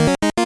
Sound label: 8bit se